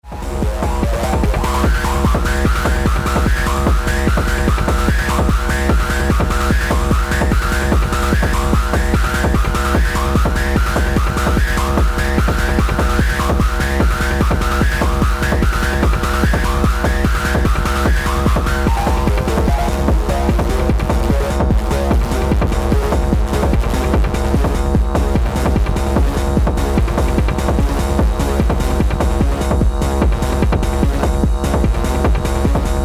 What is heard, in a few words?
techno podcast; noise